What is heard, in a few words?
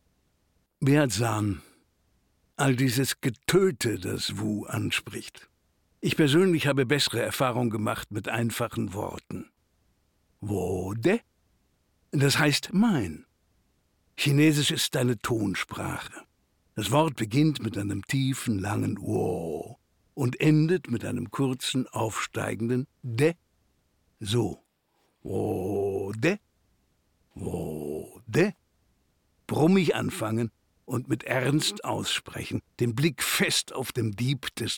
Beat